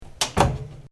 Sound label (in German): Herd oeffnen